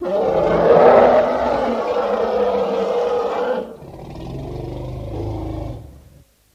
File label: Elephant Roar Longer